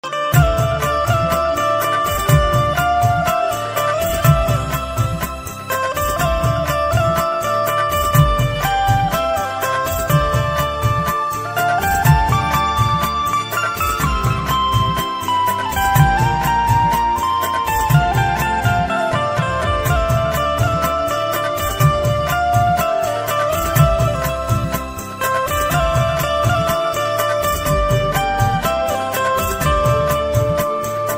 instrumental ringtone love song instrumental ringtone hindi ringtone